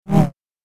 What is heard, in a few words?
Double Bladed Saber Sound; FXHome